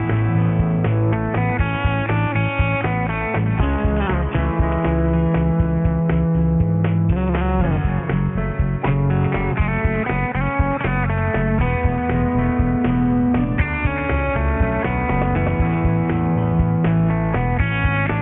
telecaster sample

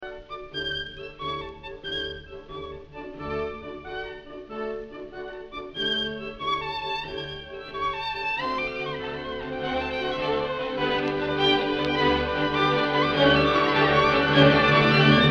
Violin Concerto; NBC; Beethoven; op 61; mov 3 III

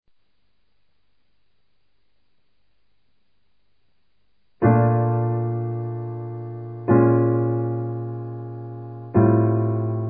Chords

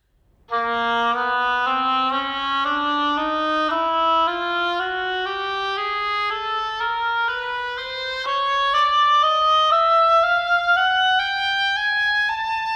oboe

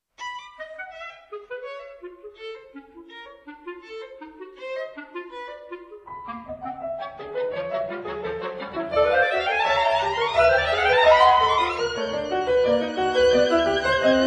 Trio

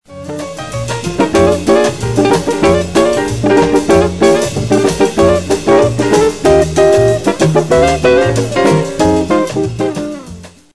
chords

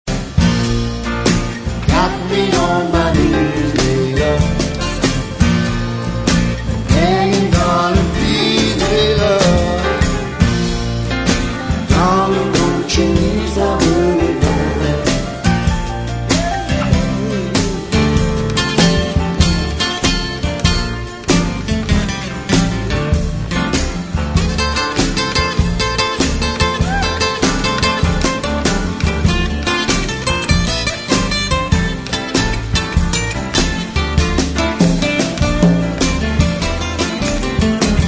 acoustic sample

